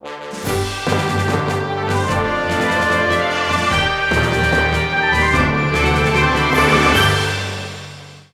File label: Victory Fanfare; The Legend of Zelda; Super Smash Bros. Brawl